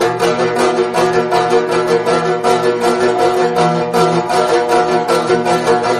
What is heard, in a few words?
banjo; music